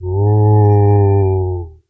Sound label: "woo" ghost; ooh ahh; ho hoo woo